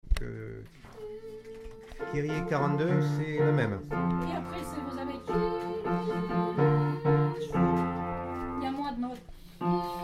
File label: Kyrie elison